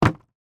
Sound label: industrial traffic cone drop; sound effects one